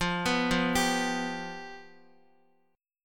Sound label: F Suspended 2nd Flat 5th, Guitar; arpeggiated; x,x,5,4,5,3; JGuitar